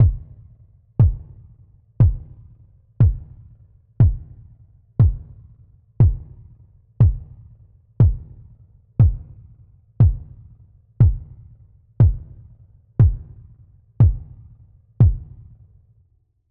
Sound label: Kick